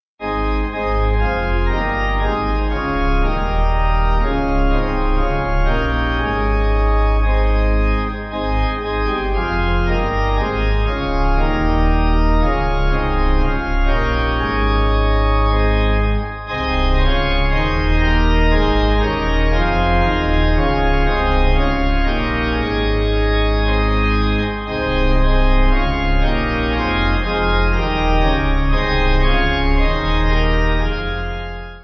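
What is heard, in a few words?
Pipe, hymn backing; Wenceslas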